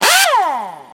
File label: impact wrench